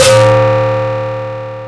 Metalen schop, FM; Polyend

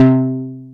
Vieze Bass, FM; Polyend

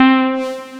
Glijden, FM; Polyend

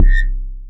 schop, FM; Estige; Polyend

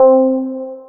Glijden, FM; Polyend

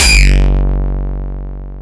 Metalen schop, FM; Polyend

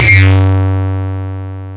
Metalen schop, FM; Polyend

